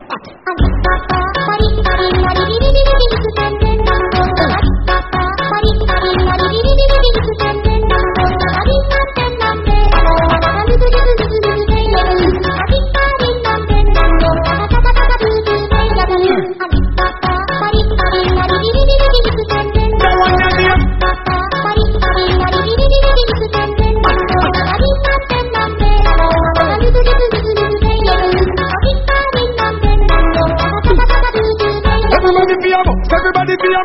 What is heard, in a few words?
remix